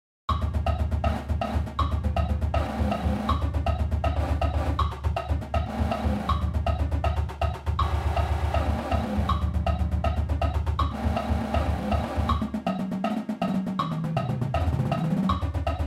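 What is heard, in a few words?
Triplet Roll, Snare Exercises; MSU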